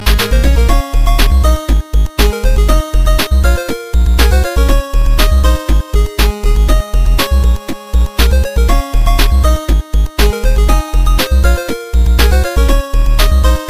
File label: 8 bit